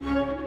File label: Music Main Amorce 128bpm; Act Jail